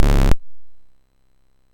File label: Dragonworld sid MOS6581R4